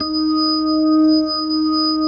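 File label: E flat 4, B3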